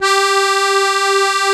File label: ZUPAN CHRO, AKKORDEON; Best Service; Alpine Volksmusik